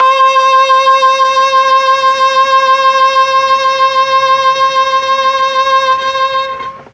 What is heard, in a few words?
C4 viola, mellotron